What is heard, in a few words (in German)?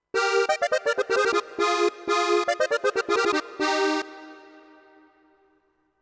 yamaha tyros 5 voices steirisch akkordeon